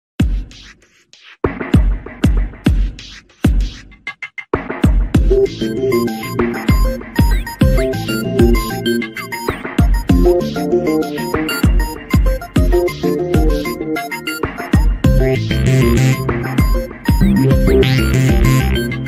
Underground; SMM; NSMBU

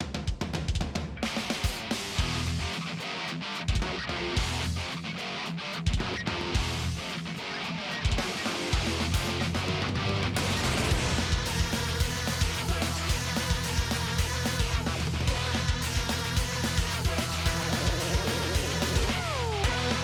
Boss Fight

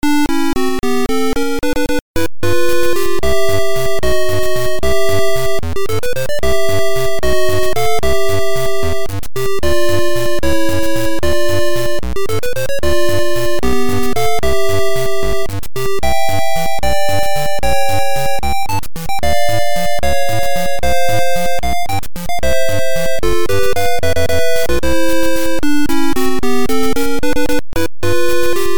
Underwater, The underwater theme

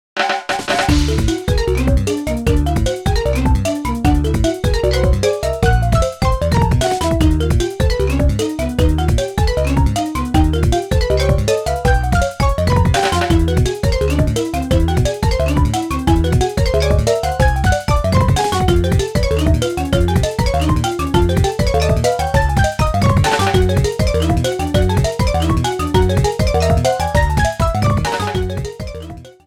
Teleporter Theme, A song

